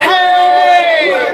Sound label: "hey", SUNG